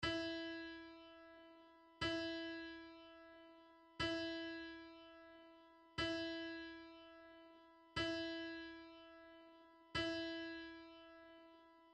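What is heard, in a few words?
high e string, guitar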